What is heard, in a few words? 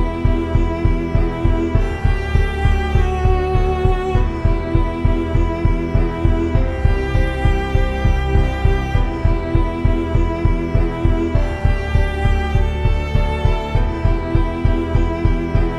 Vivo Original